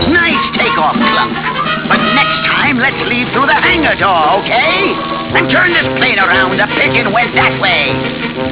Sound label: takeoff; classictv; ddmuttley